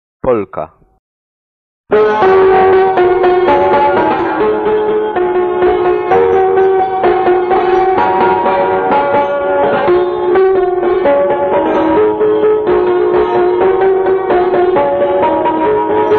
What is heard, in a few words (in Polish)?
Polka